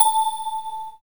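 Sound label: synth bell; E-Lab; X Static Goldmine 1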